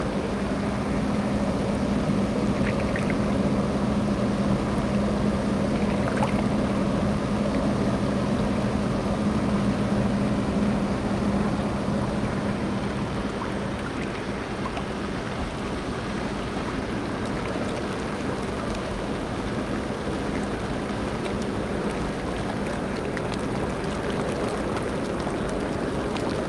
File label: hot tub sfx ambience seamless loop bubble jet set a on medium water flow; the chris alan; Sonniss GDC